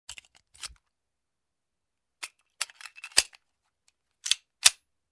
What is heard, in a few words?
Reloading pistol